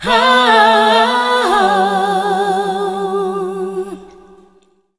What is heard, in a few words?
OH HOO, ADLIB MEN, Funky Vocals; USB Soundscan vol.18; AKAI